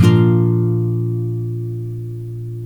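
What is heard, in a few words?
NYLON, A# MAJOR DOWN, CHORDS; Spectrasonics; Hans Zimmer Guitars VOL-1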